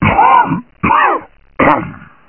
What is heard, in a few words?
bite; uboa; ichyhakase